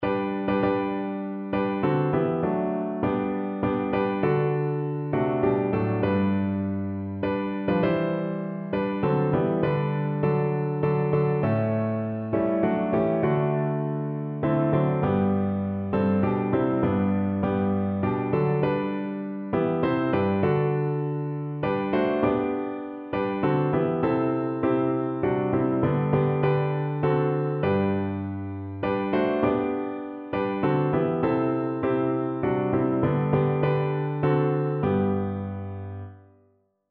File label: PNO